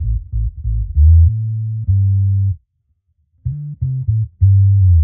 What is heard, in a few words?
Bass 95bpm A; musicradar; dub designer